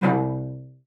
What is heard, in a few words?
C2, CELLO SPICC; Xsample VOL-5